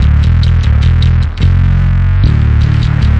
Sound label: 150 big distorted bassline